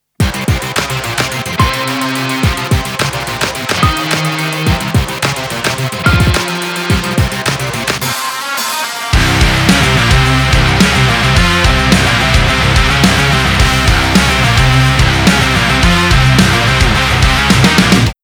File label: METAL WRITING